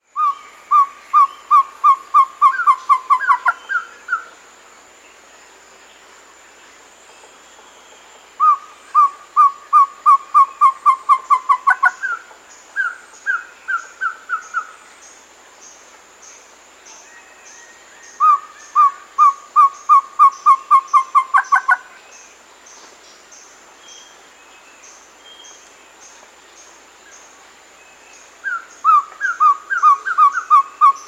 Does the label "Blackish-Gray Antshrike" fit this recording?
Yes